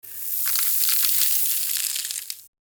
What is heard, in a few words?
Beverage ice freeze commercials ads tv; Film and TV